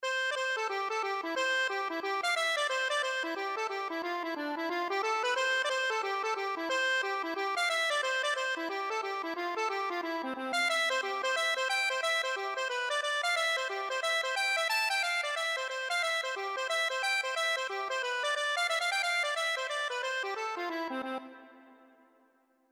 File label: five miles, accordion